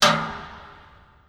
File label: bucket on; weapons